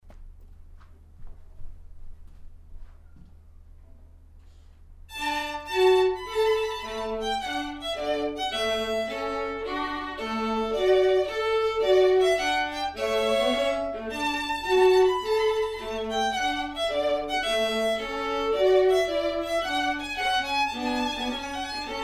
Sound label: trumpet tune; purcell